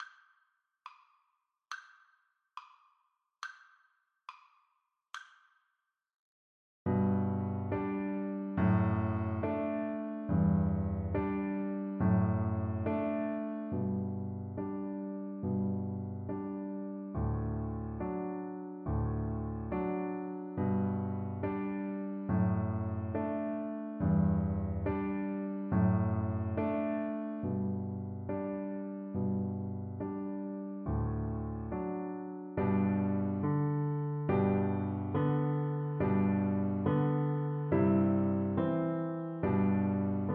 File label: ecossaise, Cello; beethoven